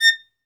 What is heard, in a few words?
Synclavier Strings, SOLO VI; ILIO